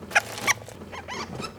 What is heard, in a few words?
Prometheomys schaposchnikovi contact; Short tonal calls at friendly contacts between a male and a female